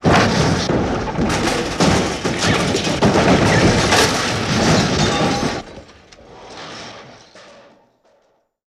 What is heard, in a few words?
Successive Crashes